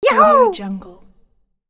"yahoo"

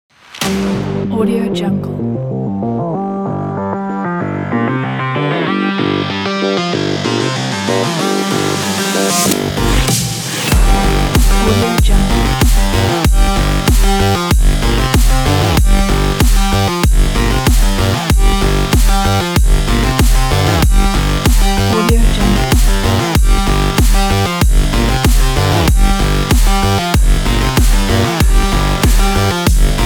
technology stylish music

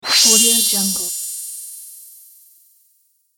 magic protection

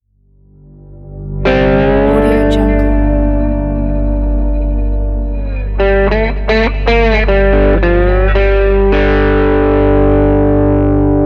western slide guitar

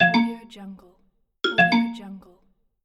computer notification

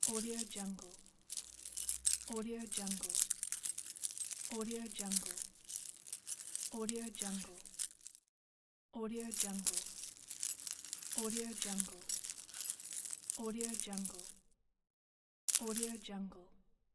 jewelry; absinthemedia

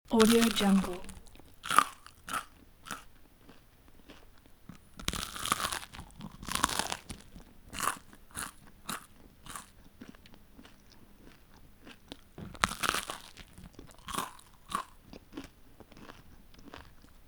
eating biting crunchy food; fxprosound

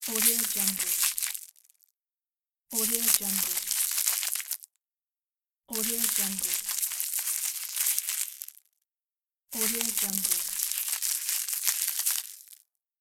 crumpling plastic cellophane

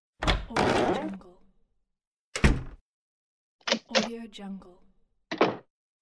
door latch

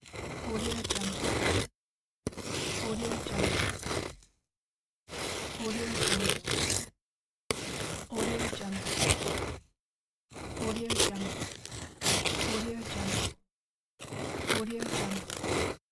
smooth ice scrapes

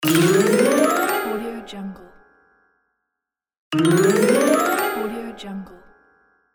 game accomplishment

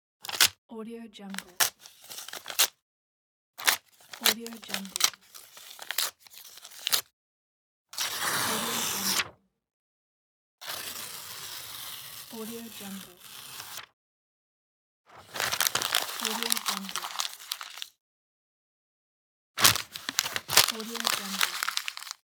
paper tearing; holy records

